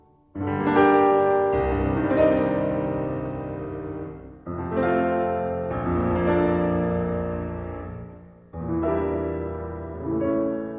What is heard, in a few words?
piano